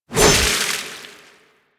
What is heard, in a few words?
Hover Whizzby Sorrow; Enemies; Warframe; Warframes; Pax Duviricus